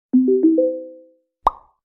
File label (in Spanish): iPhone 8 Notificacion